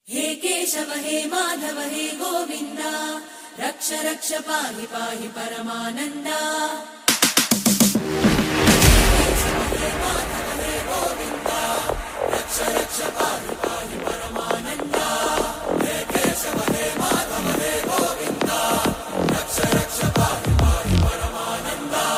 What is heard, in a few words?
krishna trance ringtone